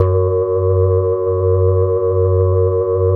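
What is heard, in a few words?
synth Glasy; Best Service Gigapack 1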